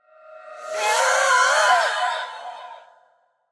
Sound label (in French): Banshee Cri; Phasmophobia